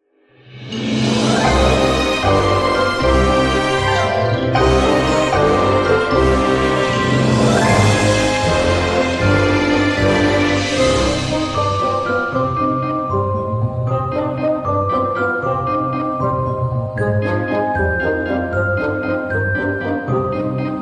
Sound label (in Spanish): Música de Fondo para Halloween